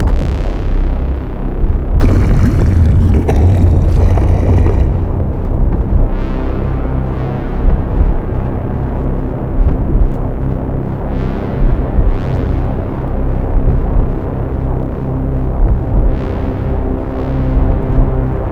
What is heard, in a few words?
Music Gameover